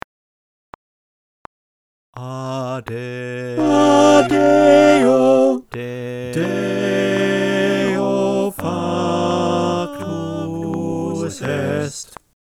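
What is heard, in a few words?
tenor click, choir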